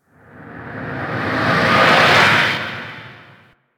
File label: Crp Ship Gun Battery Beam Void Burst Close A; Ambience; Props; Warframe; Corpus Ship Remaster